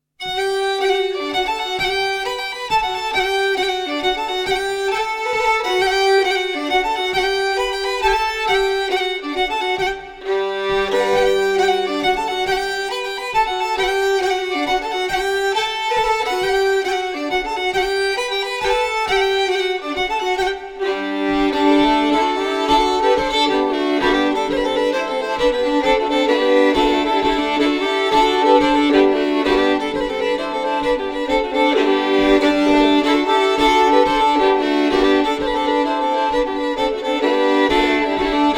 Polska, nyckelharpa; Polska Efter O Anders A Thunell

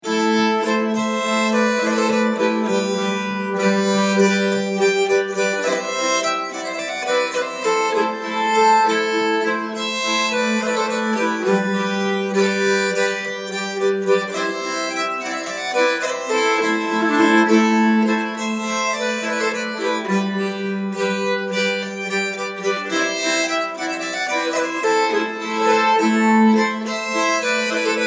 bison polska, nyckelharpa

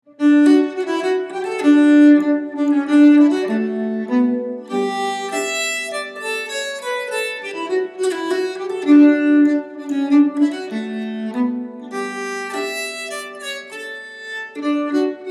polska, nyckelharpa

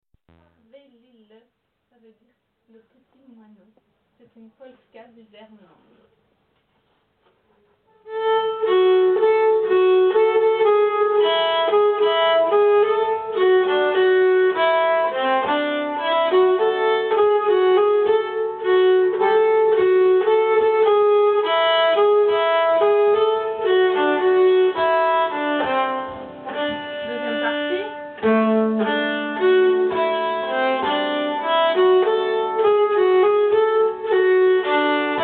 polska, nyckelharpa; polska moineau

